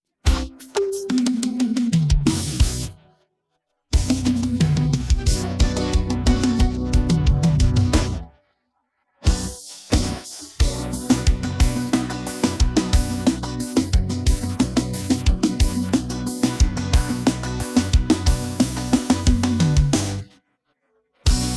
Versão Piseiro Instrumental

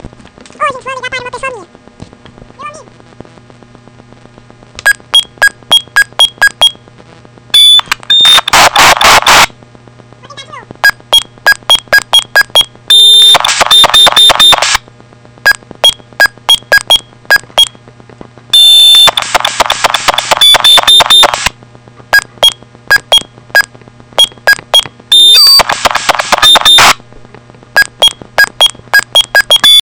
FALHA NO TELEFONE

